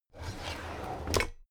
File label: drawer cabinet crammed open regular